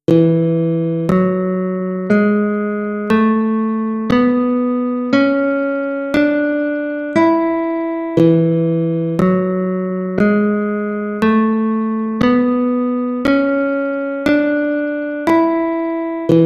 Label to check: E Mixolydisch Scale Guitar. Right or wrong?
Right